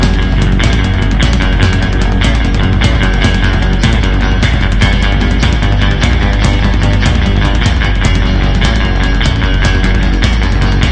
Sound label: menu music